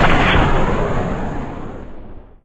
heli rocket shoot; weapons

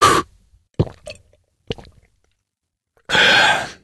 drink

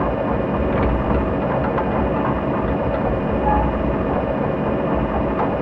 bridge move

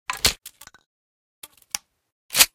toz34 reload, weapons

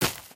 grass, human step

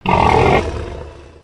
boar death; monsters

